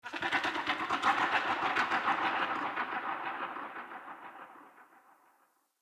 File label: Night Creature Large Bird Medium; Night One Offs; Ambience